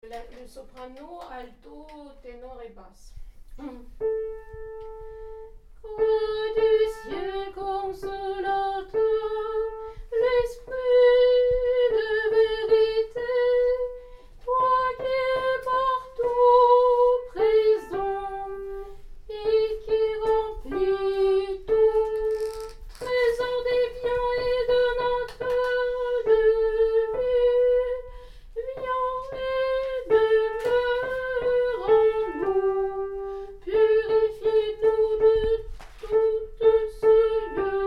soprano; Roi du ciel

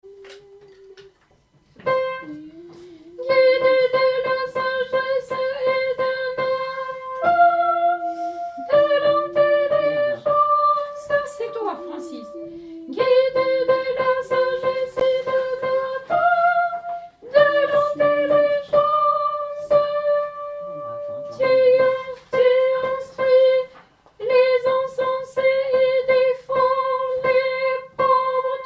kondakion tenor; Kondakion Dim du pardon; ton6; (voix ténor)

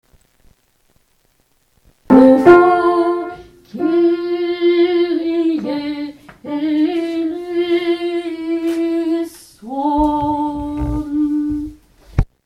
kyrie Alto

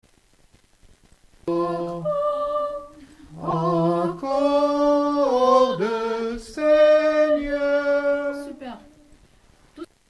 Tenor

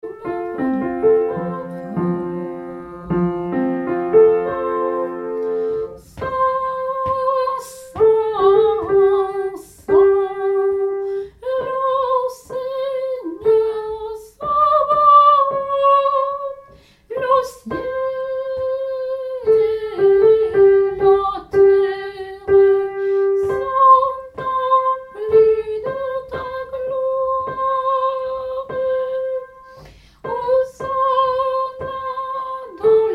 Sanctus Tenor

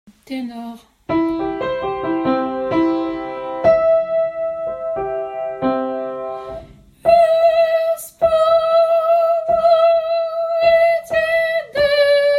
Tenor; Ispolaeta Despota final

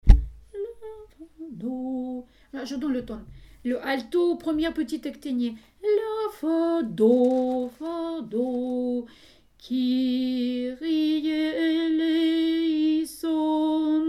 Kyrie Alto; petite ectenie

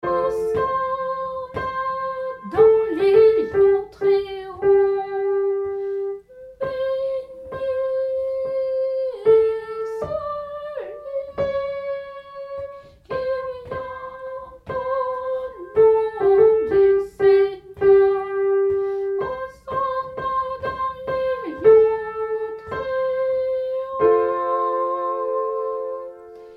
Sanctus Tenor; Sanctus part2

